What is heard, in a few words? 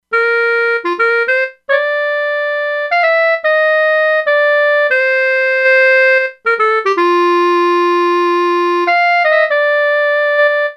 Clarinet, E-mu Proteus-2; Factory Presets